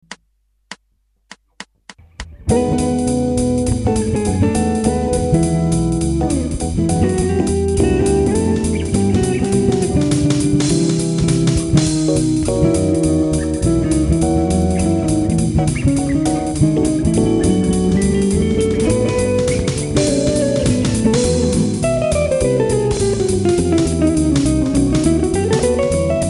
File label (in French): Jazz en live, Gibson ES 335; guitare